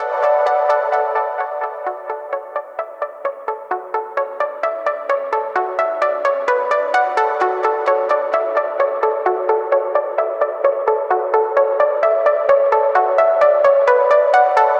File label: ambient